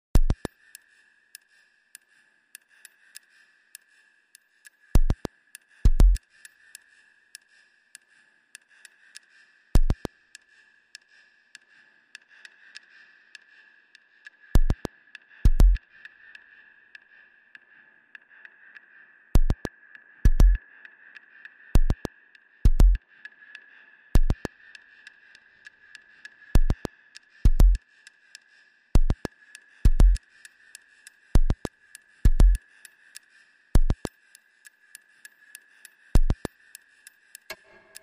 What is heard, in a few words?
Percussion and Bass Only